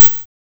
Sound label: dancemusic